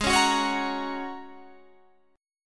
G sharp 7th Suspended 2nd Suspended 4th, Guitar, Standard; strummed; capo 9; chordshape; 16,16,16,18,19,18